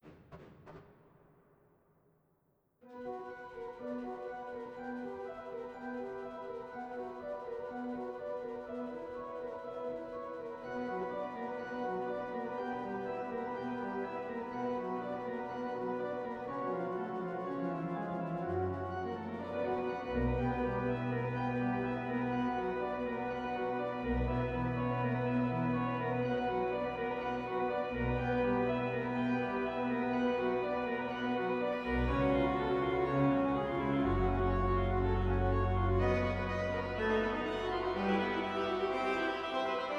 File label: Toccata in B minor, organteq; E. Gigout; Classical French Organ II (Poitiers - 1791) - customized